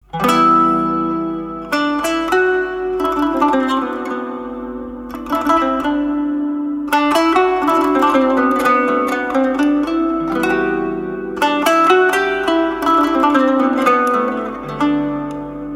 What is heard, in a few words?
Kanun